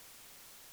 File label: NOISE; AKAI S-Series CD-ROM Sound Library VOL-4